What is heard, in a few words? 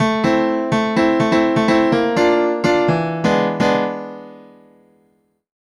DEEPHOUSE PIANO 125 A